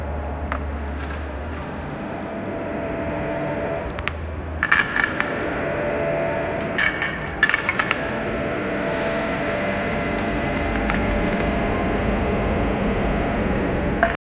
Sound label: realistic soun, historic